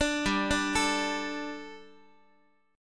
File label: G Suspended 2nd G, down, Guitar; Gsus2 arpeggiated; JGuitar